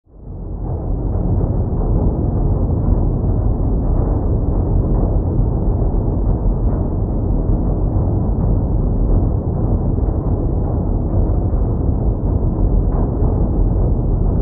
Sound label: Hum of a submarine engine